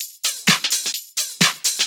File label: Electro Loop 128 BPM; Vengeance Samples